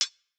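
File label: closed hi-hat; eddyflux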